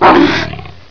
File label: bump